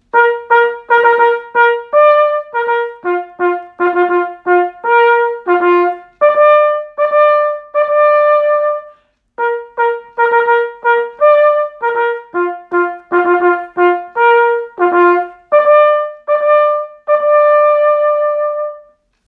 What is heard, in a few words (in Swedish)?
Tapto